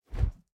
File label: Fighting Woosh; SoundsCrate